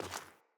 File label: Sandals Walk Rock; Player; Footsteps